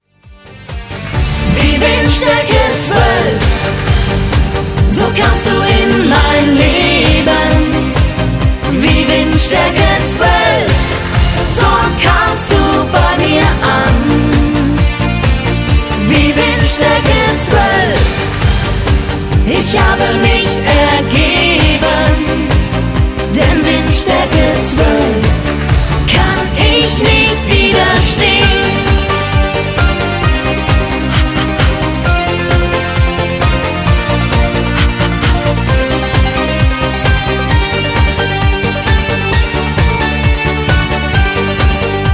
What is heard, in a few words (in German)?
Zweiklang; Windstaerke 12